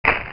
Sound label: crush